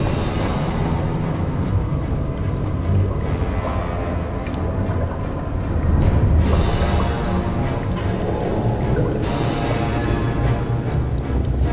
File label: Factory Combat w. Ambient; Shrek 2; GC PS2 Windows Xbox